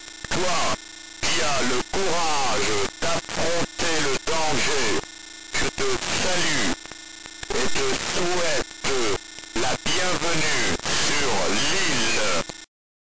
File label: Intro Digit; L'ile; Ubi Soft; Alain Massoumipour; Basic